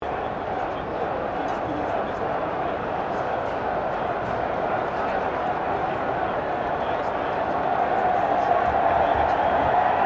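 crowd 40K Melbourne Cricket Ground ambience medium, Cricket at Melbourne Cricket Ground; Crowds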